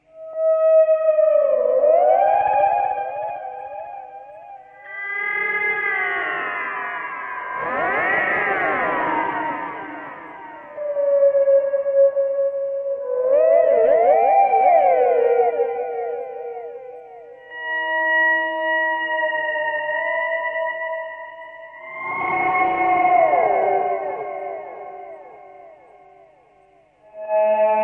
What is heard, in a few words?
guitar whalesong